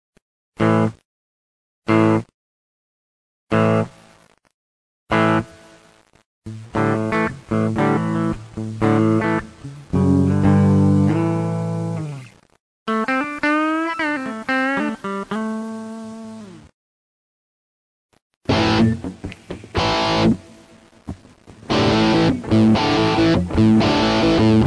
Spring

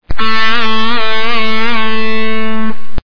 Music Simple Up

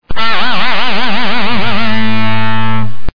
Music Simple Up Down

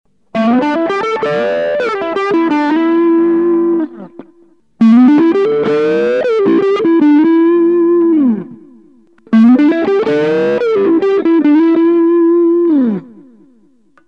Pickup Position

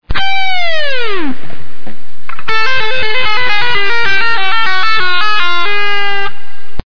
Music Dive Bombs

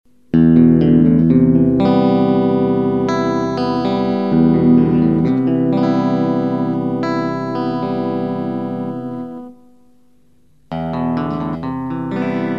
Music Pickup Phase

